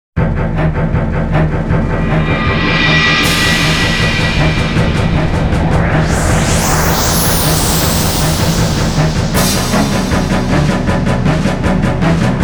ultra speshium synth

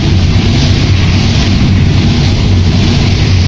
Vehicles; unreal development kit; Scorpion

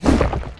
side roll attack

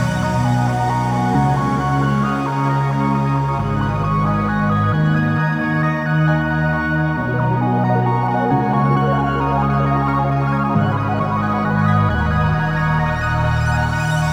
Music Skulls Menu; sayonara wild hearts 2019 gamerip

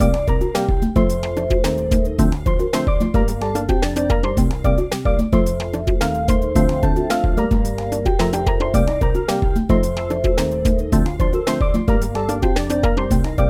Main Music; Plants vs. Zombies

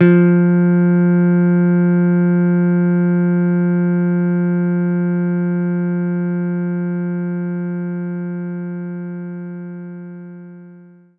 Jazz Guitar F3

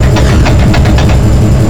Rotator

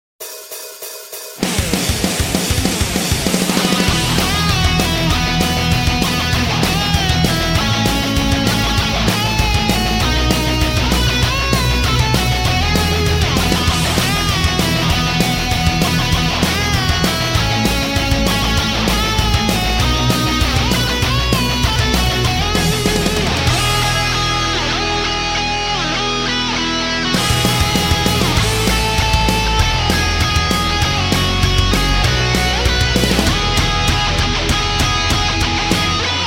Jingle Bells; santa rockstar

